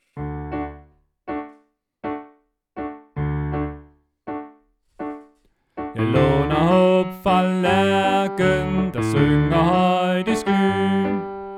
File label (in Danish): bas